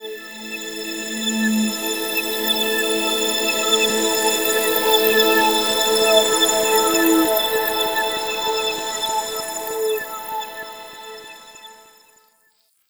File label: Star Sparkles A, Ambient; Zero-G Seismic Frequencies; 90 s Sample CDs